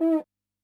OOOH, PERC

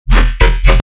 bass hit show me E; Virtual Riot

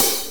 HiHat, Blues; Sonic Foundry (Sony Creative Software); Loops & Samples Drum Tools

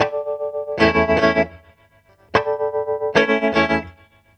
HARMOCHORD, HARMONIK; USB Soundscan vol.31; Miro s Grooves; AKAI

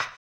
HAMBONE, Vocal Blues Soul; Spectrasonics; Planet

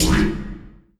Oneshot; Sonic Foundry (Sony Creative Software); Esoterik Beatz

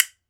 B. BONES, Celtic Percussion

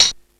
METAL, ELEC PRCS; East West; Global Sounds

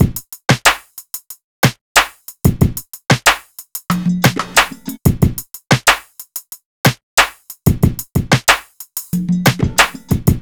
Drums, Hip Hop

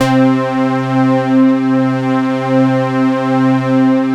C3 prophet pad; Sound Gallery - Charged

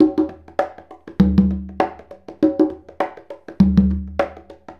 Congas; Essential Percussion

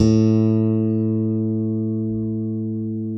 ALEMBIC BASS FING A2; Masterbits CLIMAX 7 - Bass

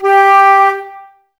flute, Wood Winds, Flutes

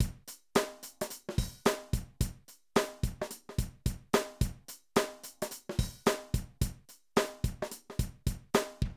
Drum loops, 70s funk

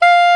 SOP HRD F, SOPRNO SX

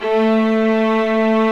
String Vlas Sect Agitato; Vas1 Agitato

